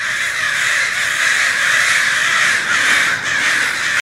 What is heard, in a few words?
crows noise; macbeth